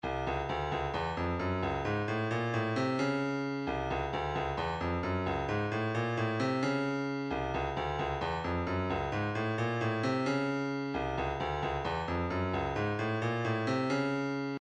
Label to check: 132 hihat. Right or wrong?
Right